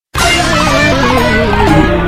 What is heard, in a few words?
failure; tf; microtf2